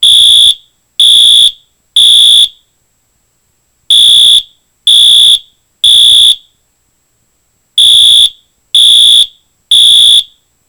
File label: Code-3 Horn